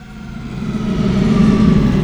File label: SCI-FI FX; Best Service; XXL Production Effects; Sample CDs